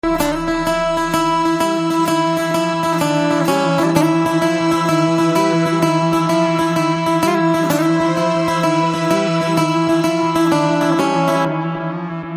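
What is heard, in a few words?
Title Veena background music; Aswathama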